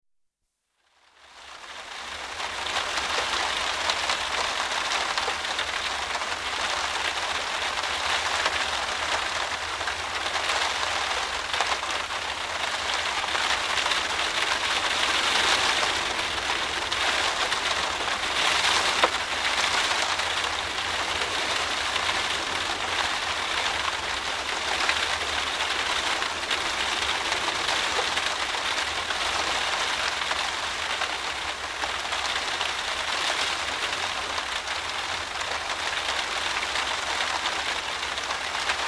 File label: Driving rain on window (Interior acoustic); Rain (Sound Effects:Weather); BBC